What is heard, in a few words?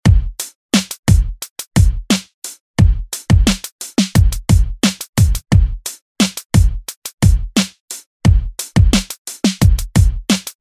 88bpm